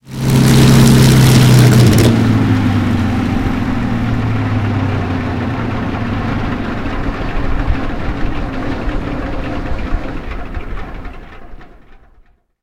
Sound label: engine stop, engines; lancaster; War Thunder